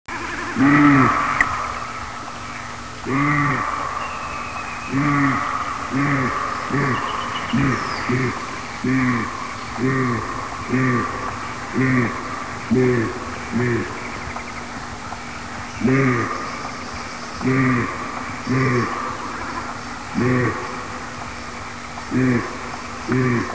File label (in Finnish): hirvi; suomenkielistä